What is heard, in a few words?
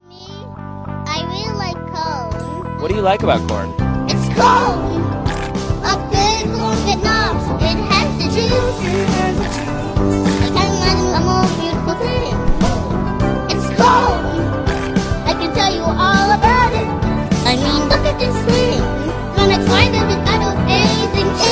singing child ringtone